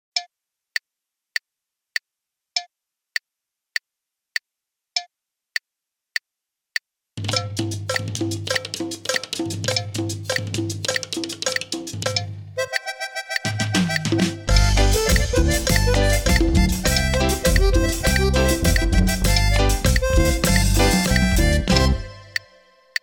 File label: Click track